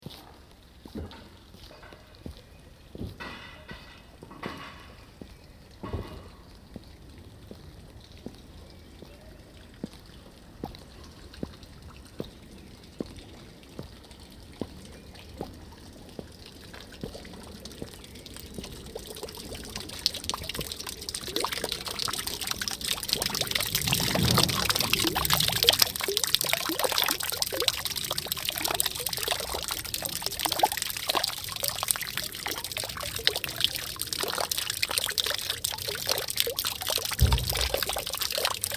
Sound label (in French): Fontaine stochastique